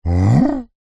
cartoon dog confused huh vocalisation; zapsplat

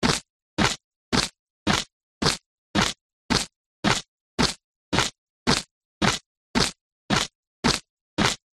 cartoon marching boots

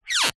string rub wood squeak; foley

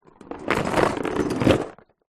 leisure magnetic building set in box movement

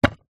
brick stack on top of another; foley

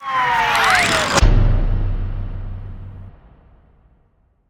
Fall Processed; Blastwave FX

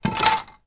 household cds multiple drop onto carpet; sound effects one

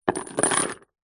industrial chain medium sized set down on wood; zapsplat

